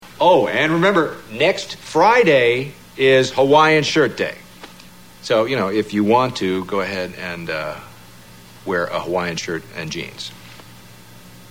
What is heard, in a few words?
hawaiian